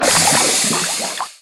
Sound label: slime